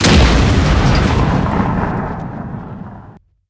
demolition, car

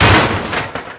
bump soft, car

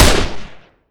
p90; cstrike; ZP CRISTIAN; ZPB WEAPONS

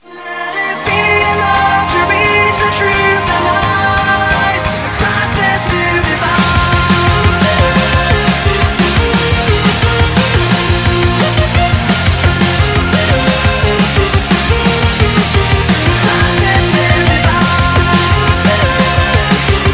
witchcraft; ze ffvii cosmo canyon v5r; cstrike

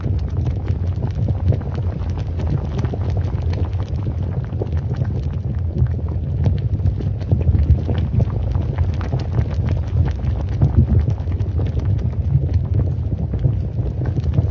lava heat; ze volcano part2 pg; cstrike

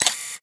car; cstrike

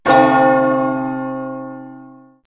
midnight bell; zm austria

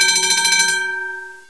bell; ijail

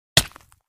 roulette oof; jb minecraft xd2ru

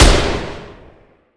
galil; ZP CRISTIAN; ZPB WEAPONS; cstrike

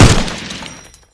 m249; ZP CRISTIAN; ZPB WEAPONS

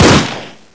ak47; cstrike; ZP CRISTIAN; ZP WEAPONS

